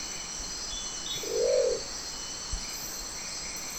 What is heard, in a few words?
Crested owl; جغد کاکلی